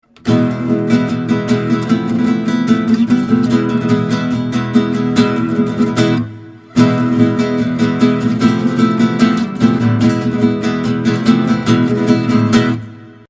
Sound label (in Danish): spansk, guitar; maestro spansk 39